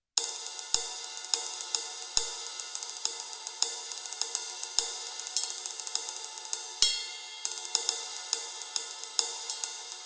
ROLAND TD 17 KVX Ride

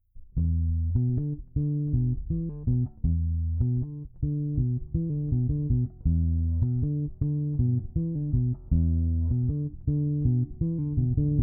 Drawmer 1972 Bass dry; Bogart

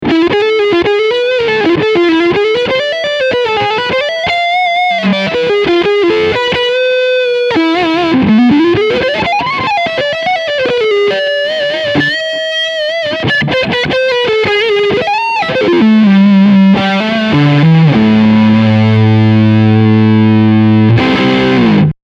Leadsound Front PU

